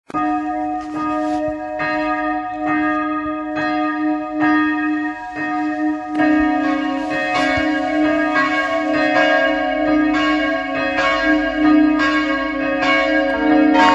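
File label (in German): Tonaufnahme ais1 cis2 dis2, mehrstimmige geläute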